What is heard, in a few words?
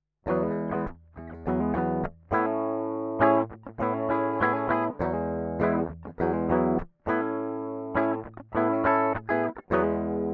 gtr D.I.